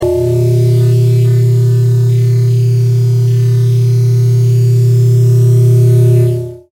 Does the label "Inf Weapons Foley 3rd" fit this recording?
No